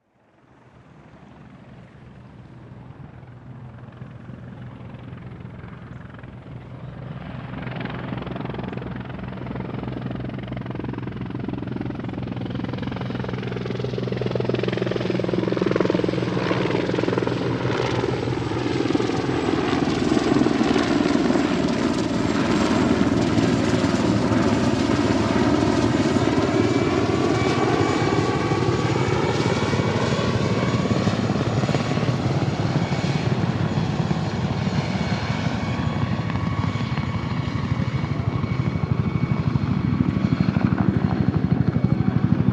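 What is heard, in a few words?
CH53 Approach By Away Med, helicopter; Sikorsky Ch-53 Super Stallion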